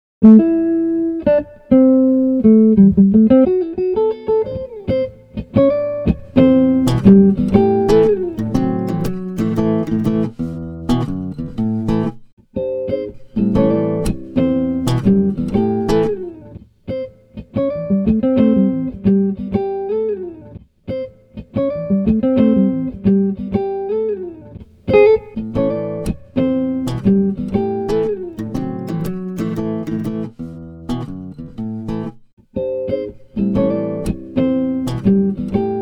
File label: Slap